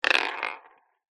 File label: leisure marble glass roll wood floor; zapsplat